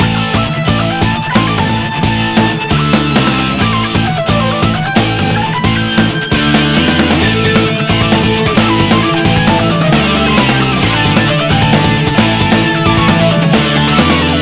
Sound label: loop